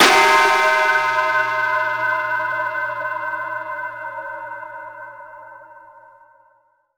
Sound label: Chime death; Curse Crackers For Whom the Belle Toils